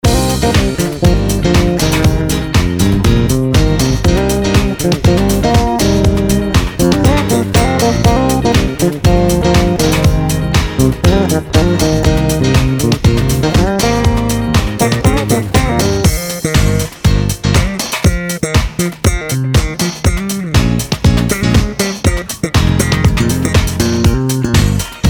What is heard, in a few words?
slap the bass